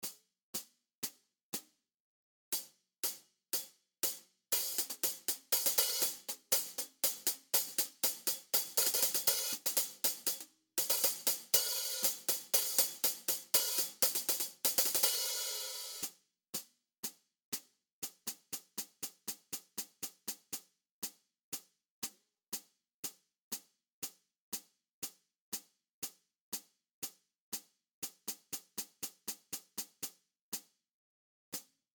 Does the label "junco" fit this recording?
No